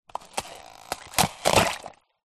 nature ice thick pressure stress break shallow water